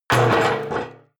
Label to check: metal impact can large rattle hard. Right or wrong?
Right